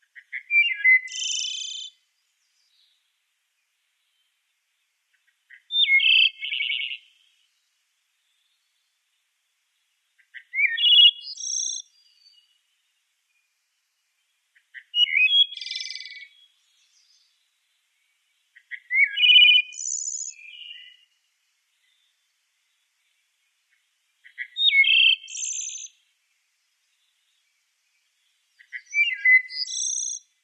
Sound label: Wood Thrush song